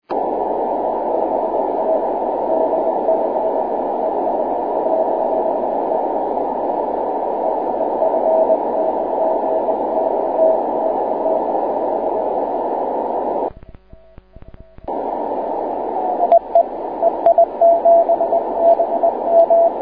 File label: 6m, 9 el, Meteorscatter, 3 bursts; HAM-radio; EI7BMB